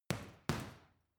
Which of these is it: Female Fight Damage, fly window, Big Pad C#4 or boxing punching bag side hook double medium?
boxing punching bag side hook double medium